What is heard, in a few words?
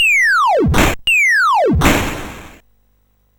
BASIC sid CSG8580R5, GAMES; Stack 64 Simon